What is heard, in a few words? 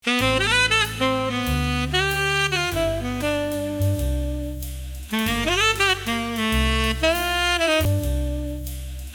bgm; freeCell